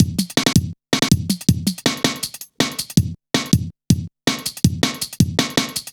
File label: Tinkle, drum loop, jungle, 161.5; Zero-G Jungle Warfare 1, 2 & 3